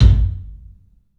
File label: KIK, LIVE CLUB KK, Drums; 3D Sound